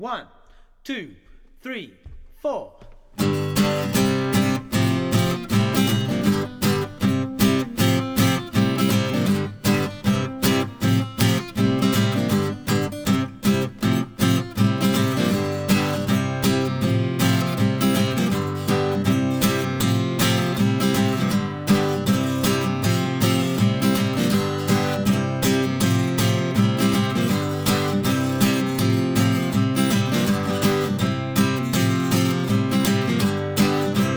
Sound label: Guitar Playback